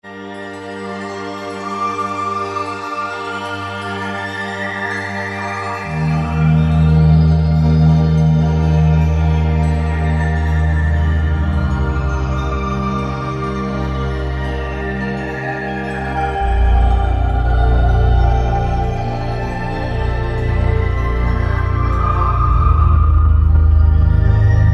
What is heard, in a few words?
Look into Abyss, Roland JD-990; Factory Patches